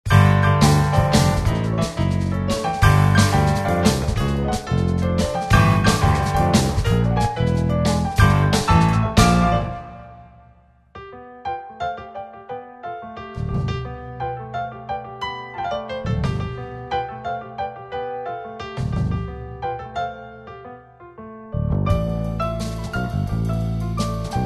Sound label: piano studio